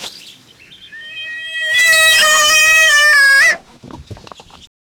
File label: pig squealing in dirty fa